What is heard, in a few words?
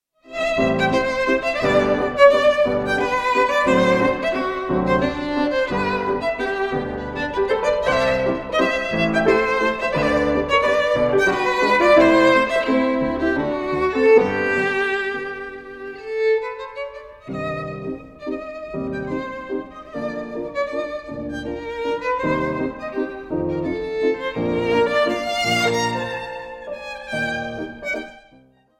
old viennese dance no 3 for violin and piano; schon rosmarin